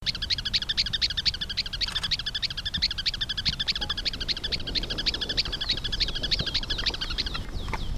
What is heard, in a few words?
Campo Miner